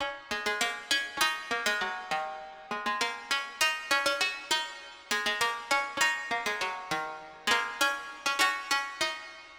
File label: Synth